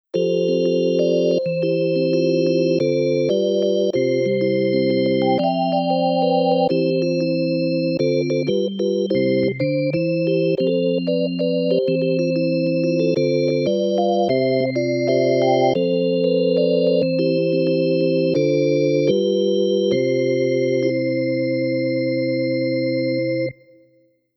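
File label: electric organ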